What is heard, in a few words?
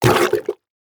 mud suction splat